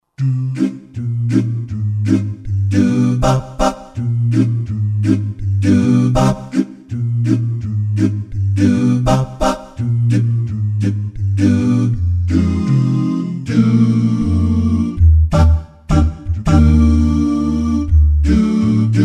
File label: Doo Bap Sw, Vocal; Roland SR-JV80-13 Vocal Collection